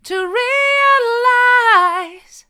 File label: to realise mid, vocal